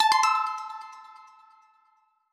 Discovery; HintsStarsLite

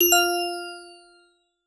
Checkpoint; HintsStarsLite

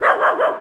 Dog Bark Triple Bark; DogBarks 1.0

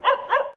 Dog Bark Seven Double Bark; DogBarks 1.0

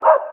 Dog Bark One; DogBarks 1.0

